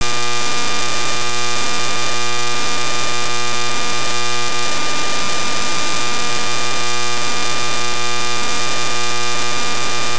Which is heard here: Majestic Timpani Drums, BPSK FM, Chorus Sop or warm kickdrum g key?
BPSK FM